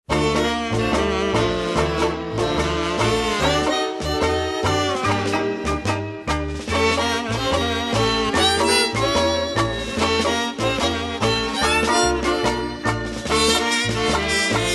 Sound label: background music ringtone